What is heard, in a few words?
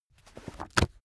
heavy book shut